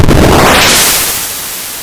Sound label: Tornado Magic